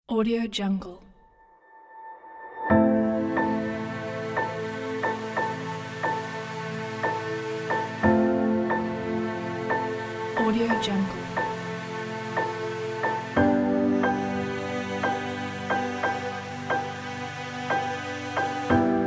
documentary

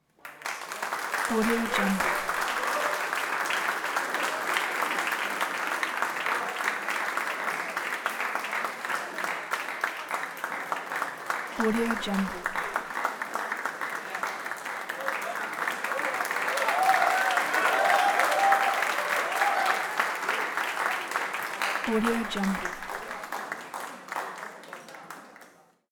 applause clapping and cheering large crowd close

